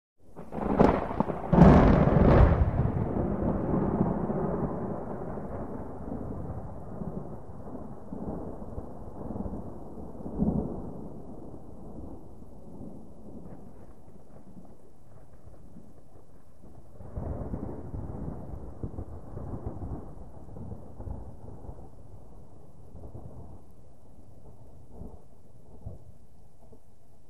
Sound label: Thunder Ripping